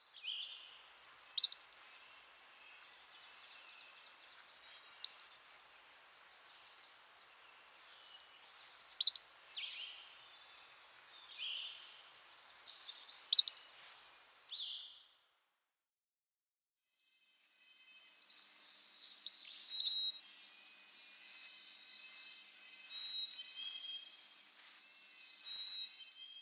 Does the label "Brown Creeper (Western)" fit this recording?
Yes